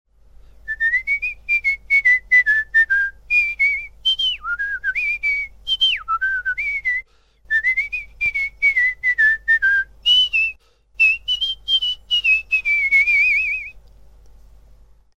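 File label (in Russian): svist dlya popugaya, parrot whistle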